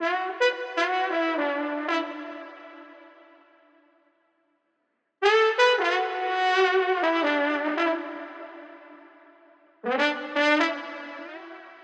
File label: 162, D, LIVE trombone; VALVES & VIBES